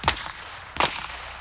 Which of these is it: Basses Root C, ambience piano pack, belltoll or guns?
guns